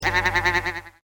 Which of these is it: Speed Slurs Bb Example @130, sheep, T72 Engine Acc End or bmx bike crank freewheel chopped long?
sheep